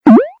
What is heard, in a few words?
press button; gingerman rescue